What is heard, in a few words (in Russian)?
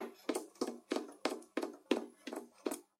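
malysh mchitsya derevyannyy pol, detskiy beg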